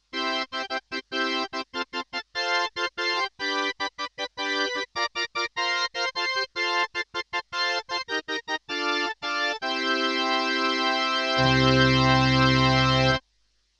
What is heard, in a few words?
Organ emulation